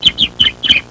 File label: Chirping parakeet